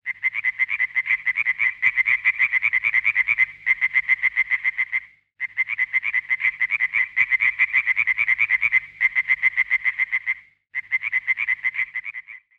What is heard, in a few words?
frogs